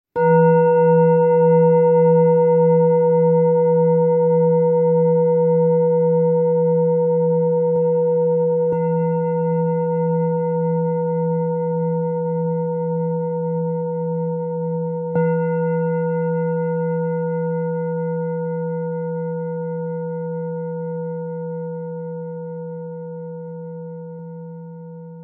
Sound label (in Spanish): Cuenco tibetano terapeutico para masaje de espalda